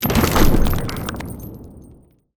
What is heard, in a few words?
ram attack, siege; 0AD